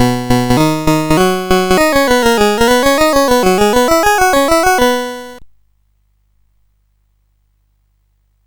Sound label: sid CSG8580R5; Congo Bongo version 2